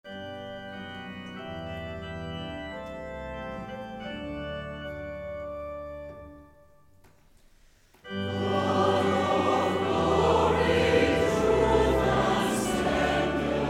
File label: Choir, hymns